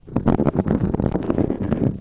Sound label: door